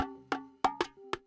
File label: Lil Fill, Drums; BB 2HTC Samples Vol 4